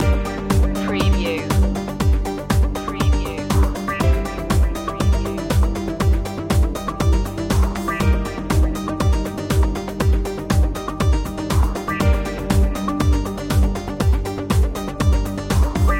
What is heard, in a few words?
perpetuum mobile loop